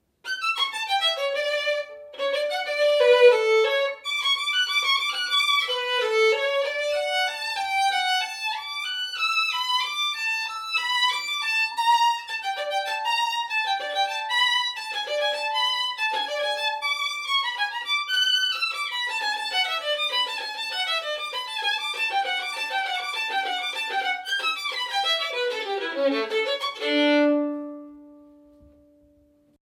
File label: Violin; Brahms